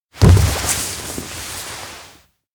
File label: new hall belly slide; chiharu; anemoi